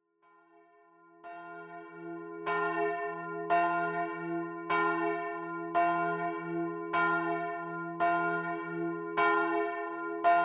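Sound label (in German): kirchenvorsteherglocke, geläut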